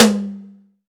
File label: huge tom drum g key; tom drum samples